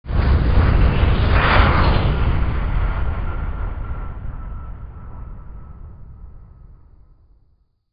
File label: spaceship